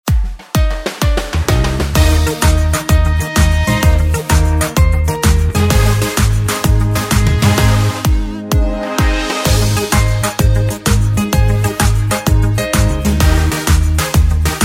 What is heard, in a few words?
music